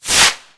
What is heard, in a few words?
Rocket launch